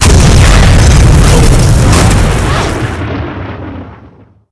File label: heli explosion